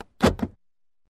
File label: unlock